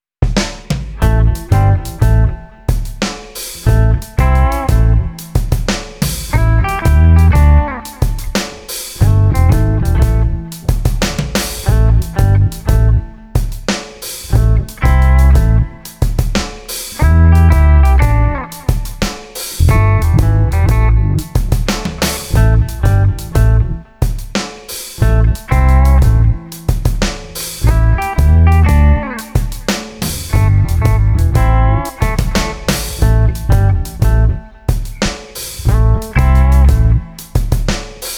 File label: Street Guitars Bayou Groove 120